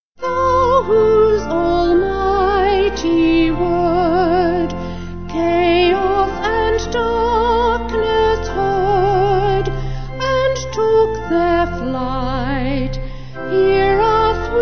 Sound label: Vocal Organ